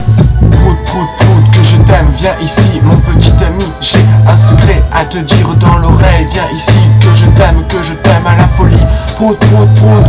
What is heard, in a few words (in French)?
prout